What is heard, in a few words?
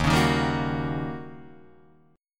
D sharp 7th Sharp 9th, Guitar, Open D